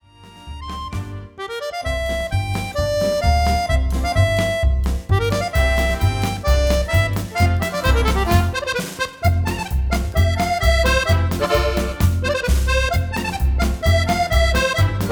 marche musette

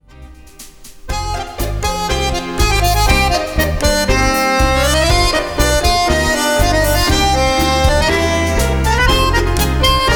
tango

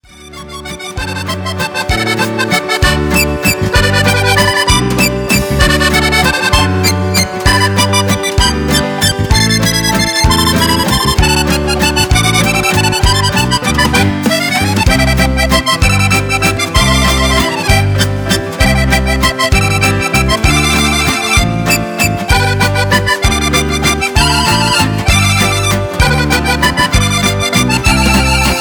acrobatie musette

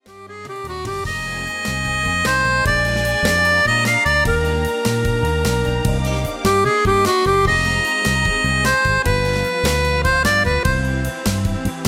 rumba

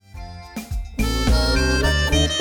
salsa

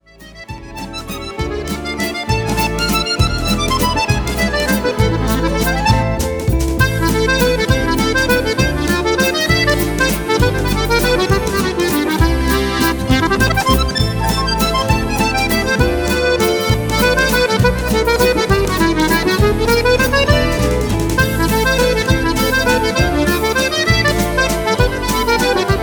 musette

